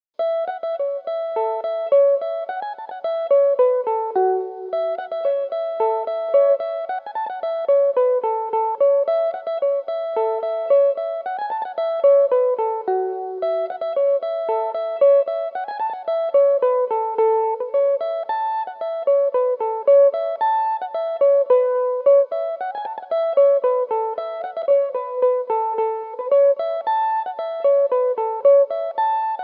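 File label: polka A major, irish mandolin, tunes; Belmuse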